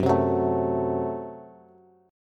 Gb Diminished, down, Bass; strummed; JGuitar

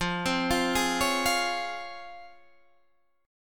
C sharp Major 7th, F, guitar chord

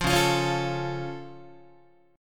Db Augmented, down, Guitar; strummed; JGuitar

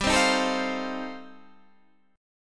F 7th G, F7/G chord; F7/G strummed

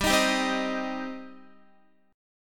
G Suspended 4th Sharp 5th, Guitar; Gsus4#5 strummed; x,10,13,12,12,10

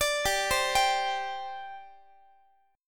G Suspended 4th, Guitar; Gsus4 arpeggiated; x,x,17,17,16,18

